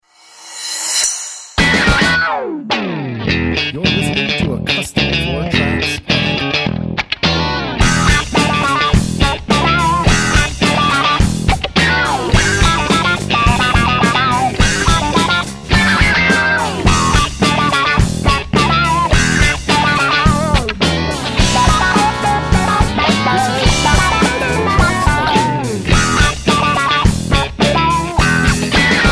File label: funkify